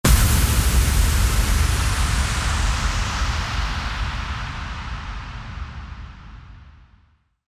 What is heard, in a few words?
IMPACT